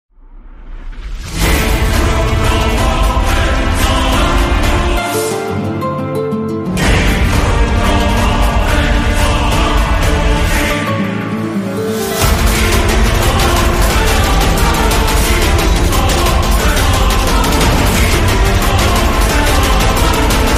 boss battle

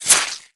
red sword

